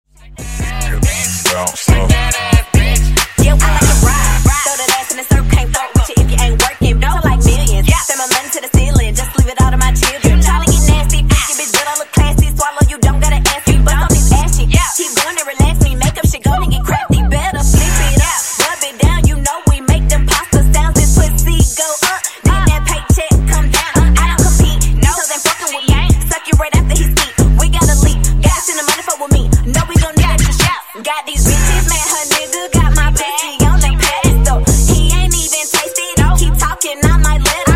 Bounce Funk Acapella Intro Outro Hype Dirty 70Bpm

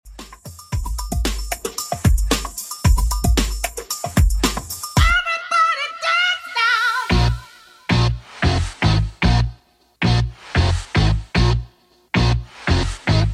90s Redrum, 113bpm

